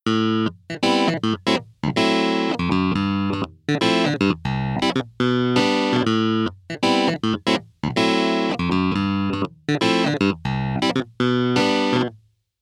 Keyboard Clavi

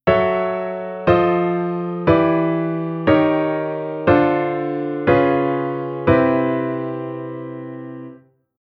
Chord Ex Dom

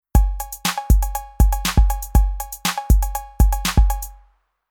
Rhythm Electro

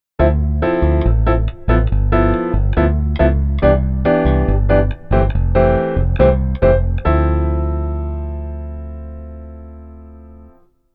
keyboard rhythm

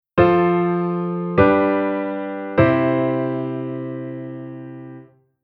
Chord Diatonic Subdominant

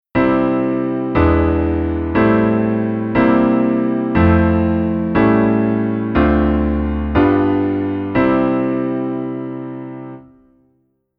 Chord Pad; ABILITY

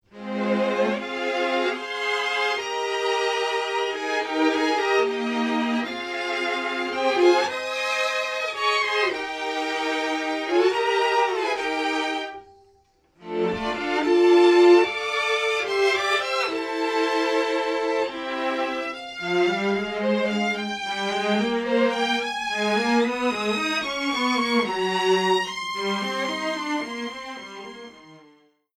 Strings Amb2

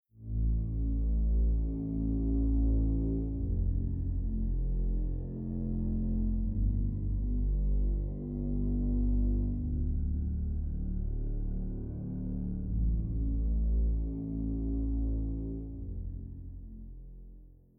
Future bass Chord Pad Lo

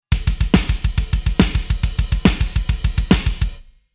Rhythm Metal

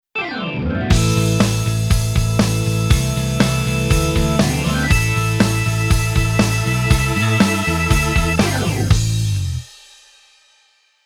Keyboard Organ Rock

